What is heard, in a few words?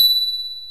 VIBES BELLS